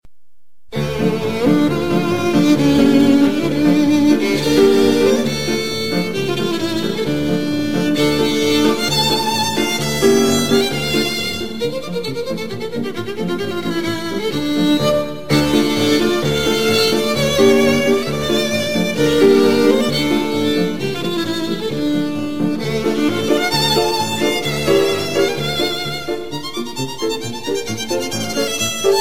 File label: Violin Ringtones